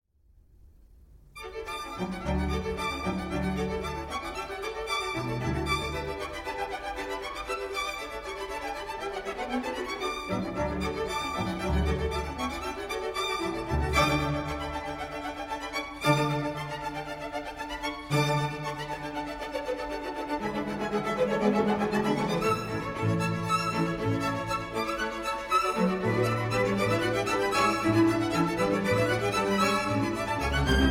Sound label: Serenade for Strings, Vivace; Karl Munchinger